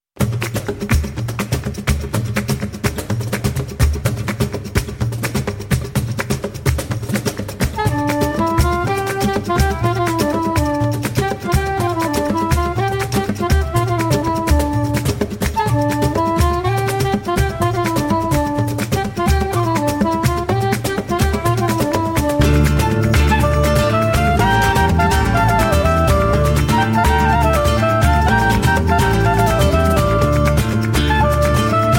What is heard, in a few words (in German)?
band rumba